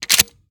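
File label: reload empty pump fore end, shotgun; reloads; iw8; romeo870; gmod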